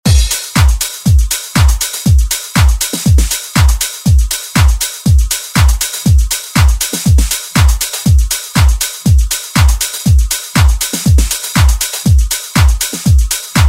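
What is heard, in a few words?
Dance Extended Redrum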